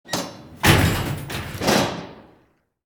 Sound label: Air Shaft Ladder down